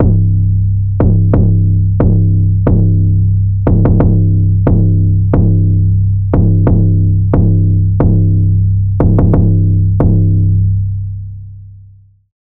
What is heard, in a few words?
Bap Boom; Wave Alchemy - Bassynth 1.1.0; Preset: Bap Boom